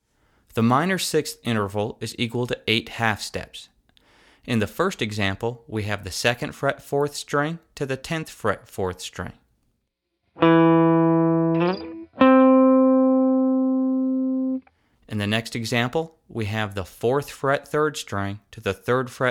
Minor 6th

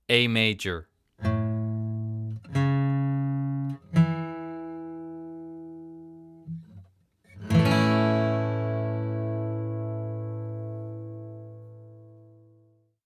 A Major Chord

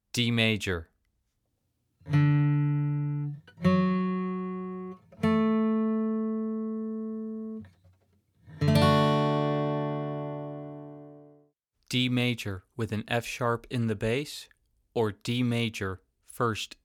D Major Chord

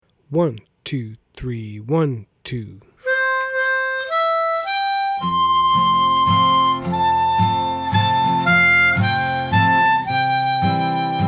On Top Of Old Smokey Fast

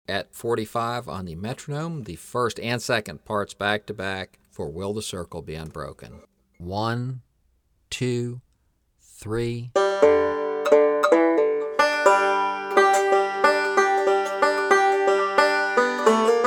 Will The Circle Be Unbroken Breaks, 45 Bpm

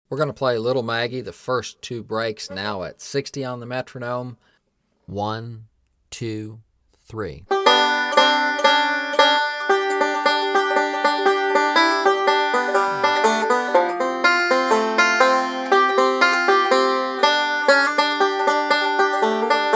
Breaks 60 Bpm; Little Maggie

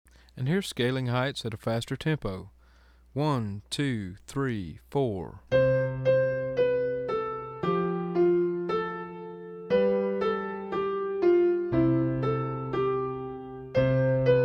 Fast

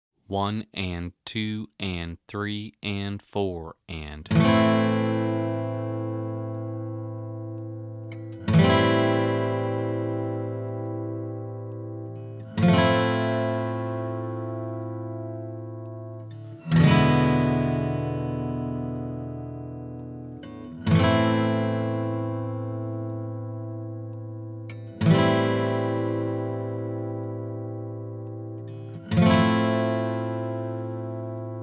Arpeggios

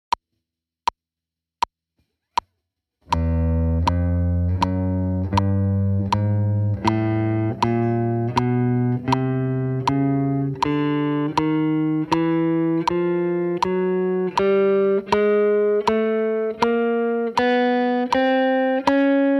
The Chromatic Scale

